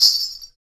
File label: METAL, Afro Shakers Ankle Pods; Roland LCDP14 Africa VOL-2